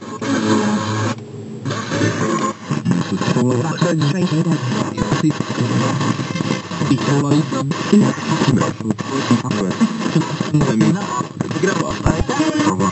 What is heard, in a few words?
FX Athmosphere; Vengeance Samples